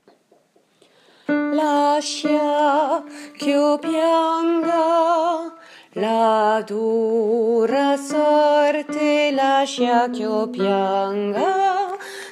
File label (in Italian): basse